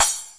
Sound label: crash cymbal